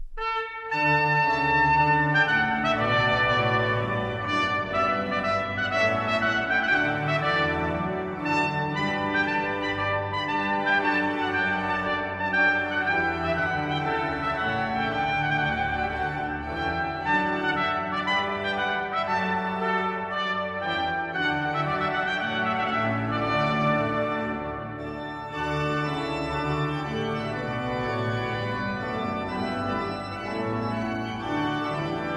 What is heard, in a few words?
trumphet voluntary; John Stanley